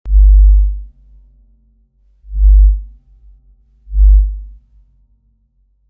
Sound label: lightsaber low end woosh; SFX